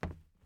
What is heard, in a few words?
plastic step; teardown; ps5 windows xbox series xs